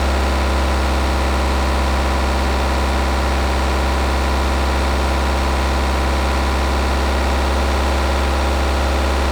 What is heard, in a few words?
Mini Countryman F60 SD, ONB, Designed, 2k rpm idle loop; Soundholder